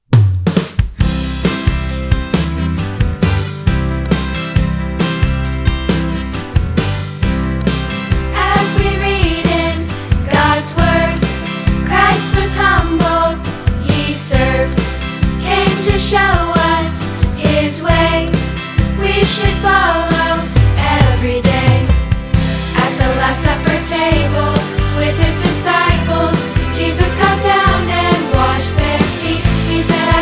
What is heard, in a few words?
Band